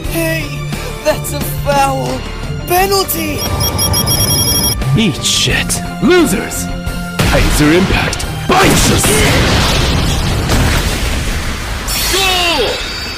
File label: Impact; Kaiser